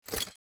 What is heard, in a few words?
formation editing